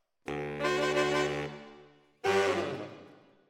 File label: ORTF SCHOEPS, piano